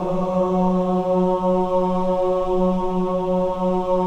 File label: CHO AH, Choir of Ahs; Roland - LA Composer vol. 1